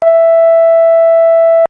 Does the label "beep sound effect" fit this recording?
Yes